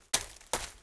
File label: RUN, Monster; Champion; 금전방; 무적폐랑